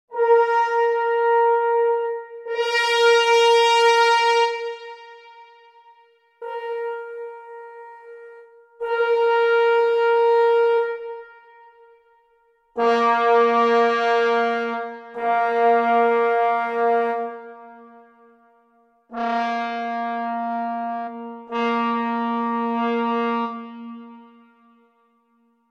Brass Cuivre; Spitfire

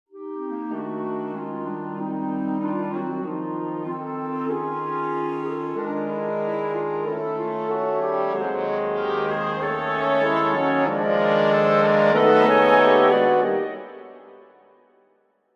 wood brass

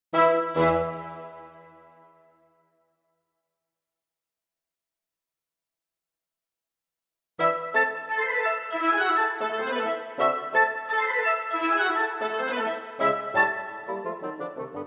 wood

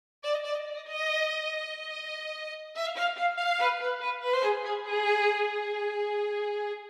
Vn1 solo

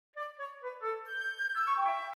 Ashibue fl

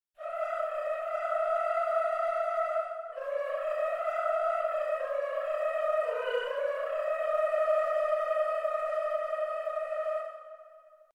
vocalise

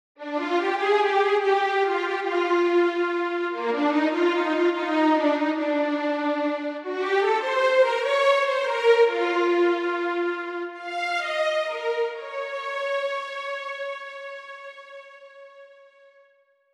perf leg Vib strong

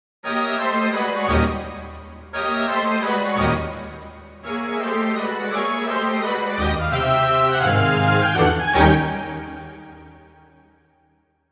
wood strings

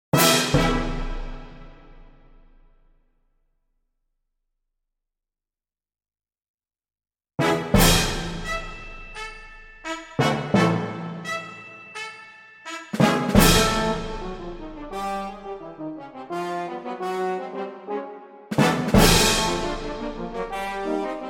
brass

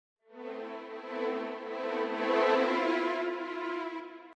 Vn1 C; Verdi

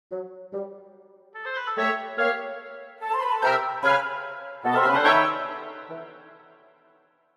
Intro wood

